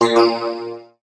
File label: error; MinecraftChat